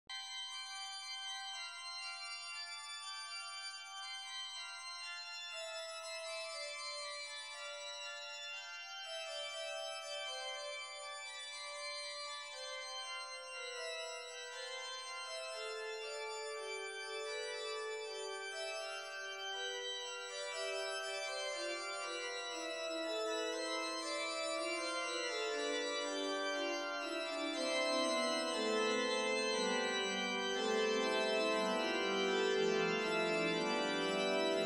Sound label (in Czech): preludium a fuga c dur